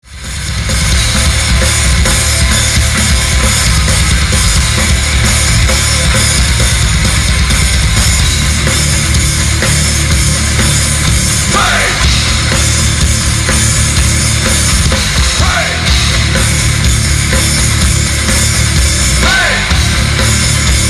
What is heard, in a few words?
Horse Live